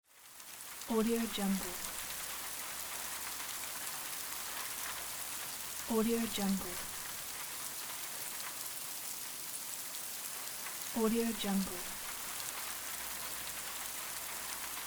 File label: rain; lfo-sound